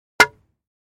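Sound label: baseball sounds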